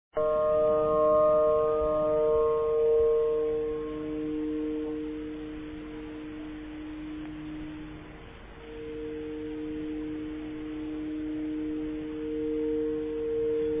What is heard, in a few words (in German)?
windharf; Aeolian harp; The natures romantic voice or a simple musical automatic machin; The harmonic music of an Aeolian harp.